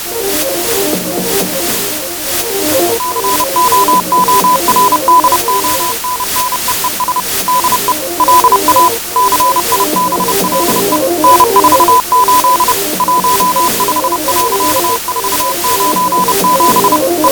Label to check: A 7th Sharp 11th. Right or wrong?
Wrong